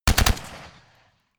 rifle ak-47 exterior fire burst

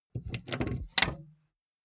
car bmw e46 exterior gas cap open short

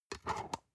storage lid slide close soft, box; objekte

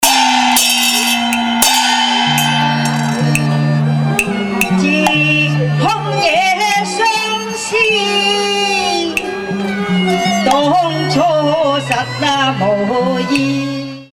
street festival in china; music elements; grooves

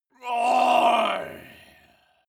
male groan painful

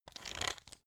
pepper mill slow soft; berufe; gastronomie; soundpool

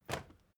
step crowd march quiet single left; schritte

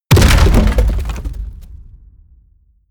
hit wood, hits impacts

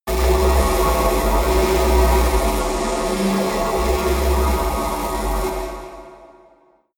space alien energy plant high busy

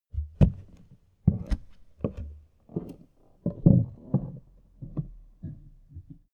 step up on the attic; schritte

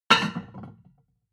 plate on plate place medium, restaurant cafe

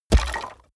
coloss step on bones

